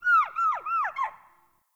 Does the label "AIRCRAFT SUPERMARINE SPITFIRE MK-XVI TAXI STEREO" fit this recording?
No